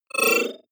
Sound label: disappear flicker; soundpool